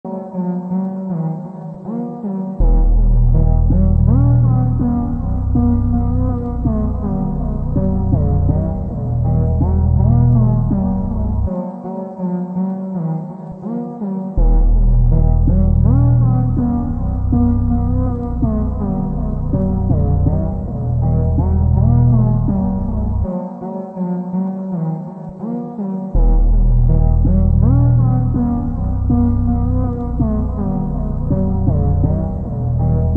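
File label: instrumental slowed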